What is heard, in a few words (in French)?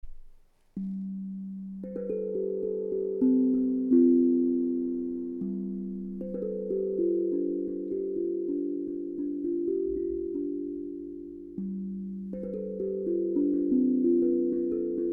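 sol 432 hz, Tank drum; Osb Drum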